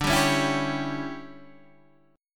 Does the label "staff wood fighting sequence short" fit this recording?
No